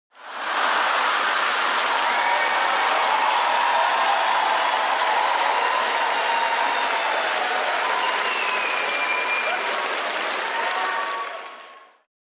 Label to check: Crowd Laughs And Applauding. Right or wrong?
Right